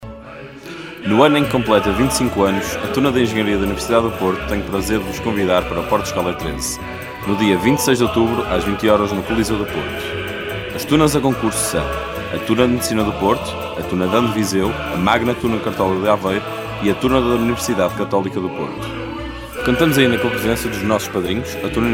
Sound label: Jingle